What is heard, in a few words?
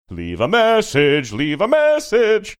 singing leave a message